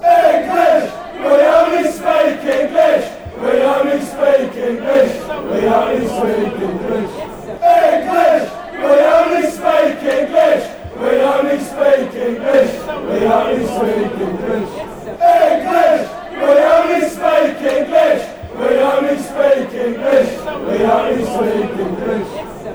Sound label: we only speak english, fanchants; charlton